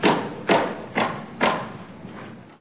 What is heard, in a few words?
hammer; Source Engine; cstrike